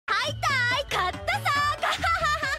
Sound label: gahaha